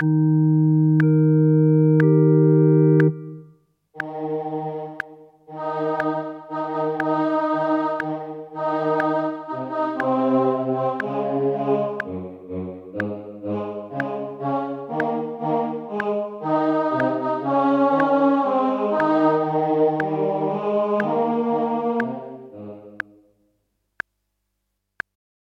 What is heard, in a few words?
O clap your hands; Gibbons